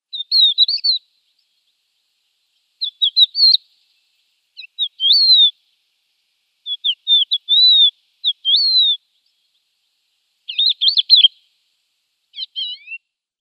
Thekla Lark calls

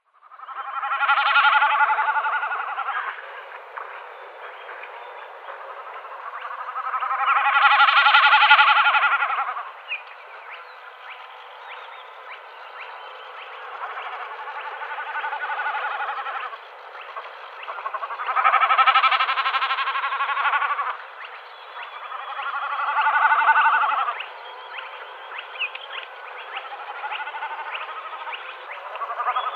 Common Snipe drumming

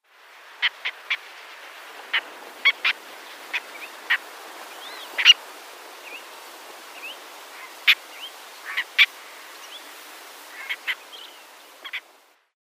Spotted Redshank flock calls autumn

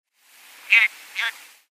Ruff male call on Arena

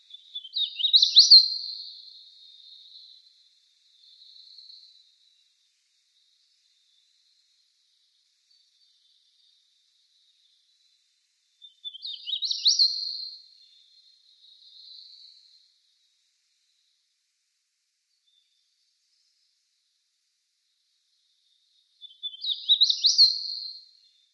Hooded Warbler song

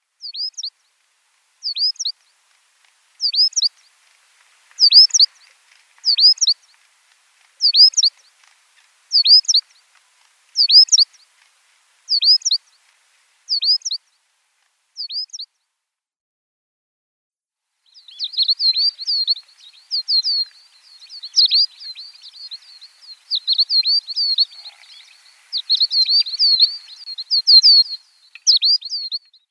Black-crowned Sparrow-Lark song

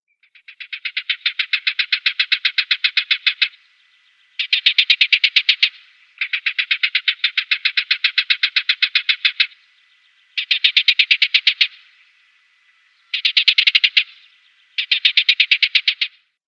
Barred Warbler calls

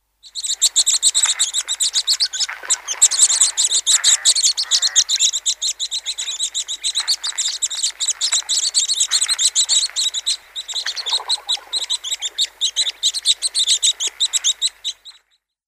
African Silverbill calls

